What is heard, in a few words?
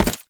Step Default, Foley, Footsteps; Warframe; Lasrian; Lasrian Soldiers; Lasrian Butcher